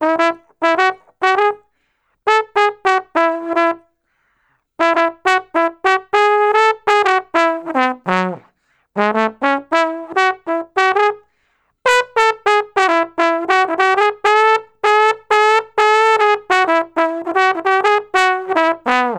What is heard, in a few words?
Trombone Solo, Jazz